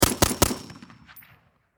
Machine Gun 7.62 Caliber Springfield M-14 Triple Shot Distant Perspective; Warfare